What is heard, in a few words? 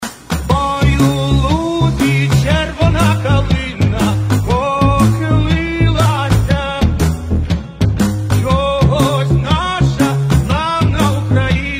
ukrainian folk song army remix